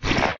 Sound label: Leech Maw Shoot; A Robot Named Fight